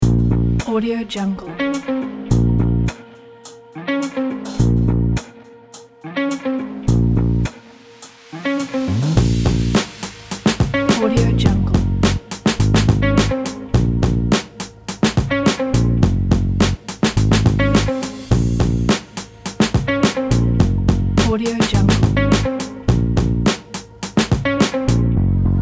guitar hip hop

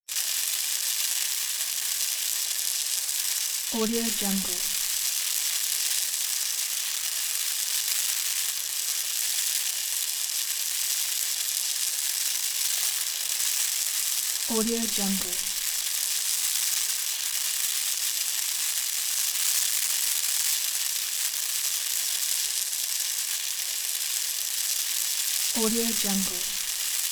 fuse burning; sounddivision

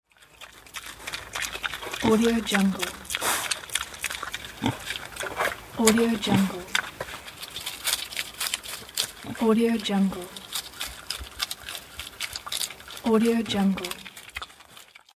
pig eating

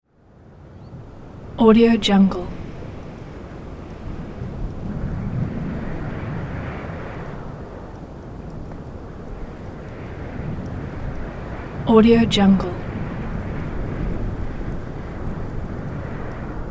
wind mountain light whistle

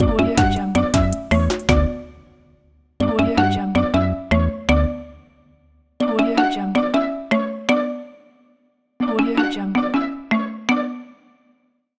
bright logo

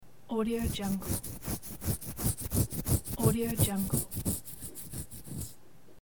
itches scratching head

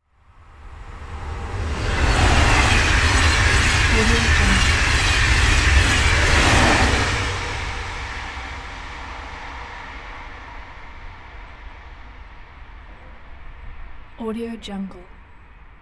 hispeed passenger train passing

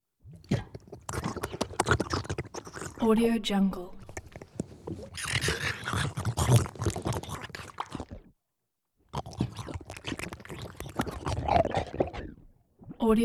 face transformation wobbly squishy bubbly

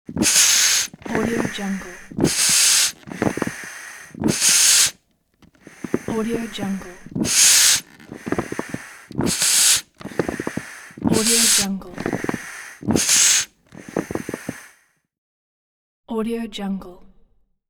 bellows fire fan

